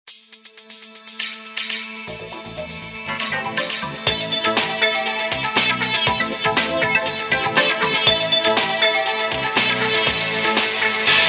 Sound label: musicsting